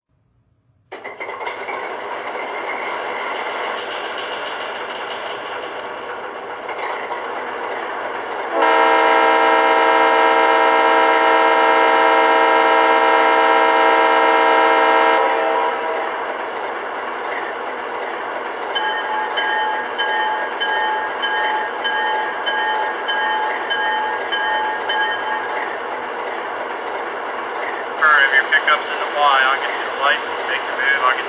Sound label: RS-3